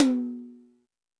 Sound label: Toms, E-MU Pro-Cussion